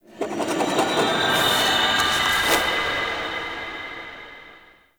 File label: Echoes Paper Scribble; Warframe; Ambience; Entrati